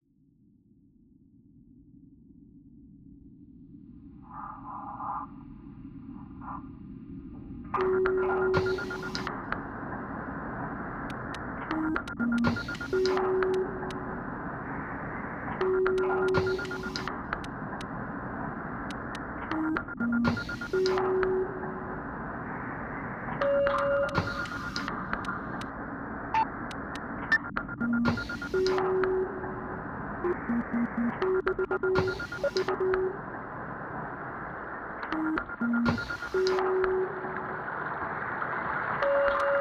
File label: 5 sounds etude